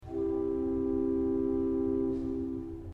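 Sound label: salicinal 8 C, organ; srednja vas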